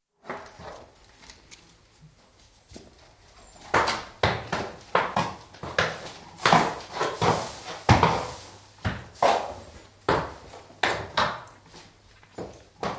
Horse walking around concrete floored stable (Live acoustic recording); Horses Walking; BBC